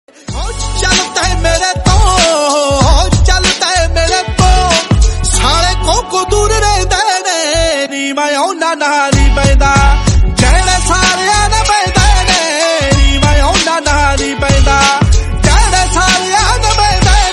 punjabi song

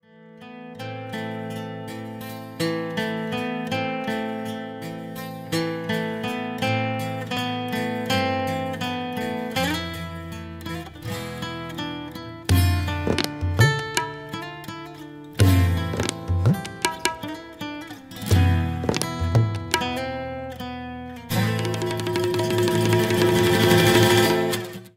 Bella Ciao Guitar

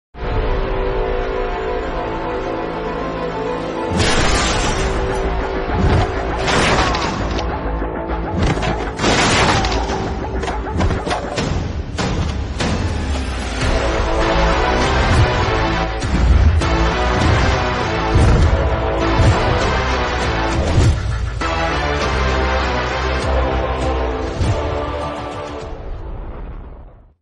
entry music; baaghi raghav

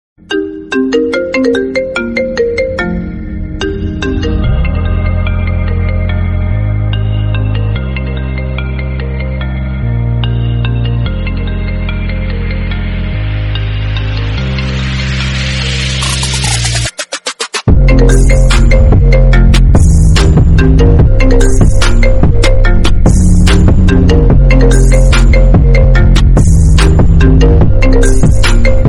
iphone remix

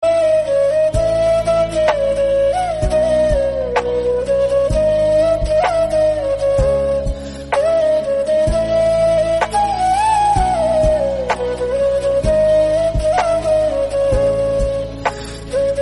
flute instrumental

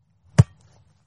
kicking football

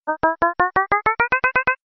short sms

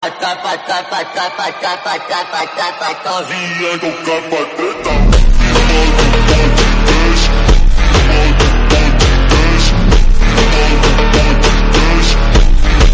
slowed reverb bgm

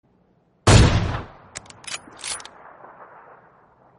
thunderous burst a resounding release of power

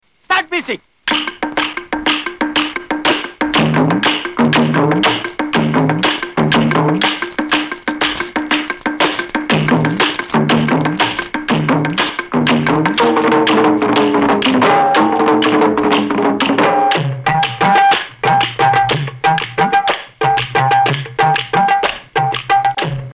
comedy music; comedy coudan

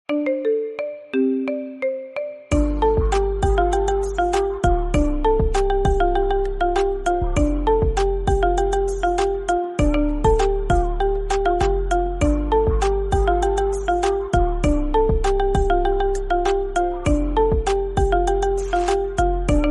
marimba remix

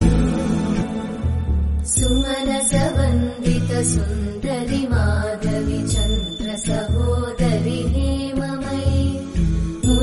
bhakti stotram

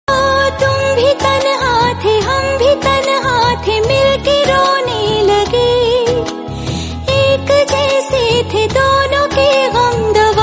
lofi

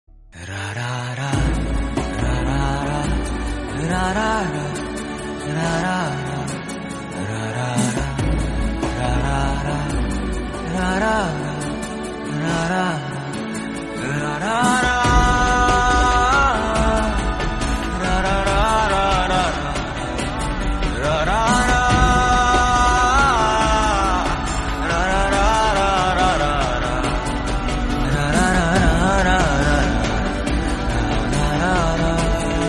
happy birthday bgm

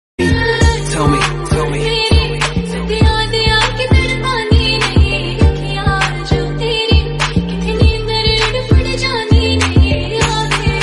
slowed reverb bgm

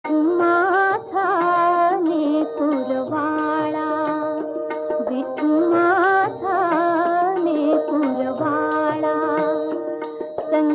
marathi song

